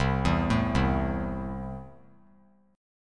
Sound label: C 6th C down, chord; C6 arpeggiated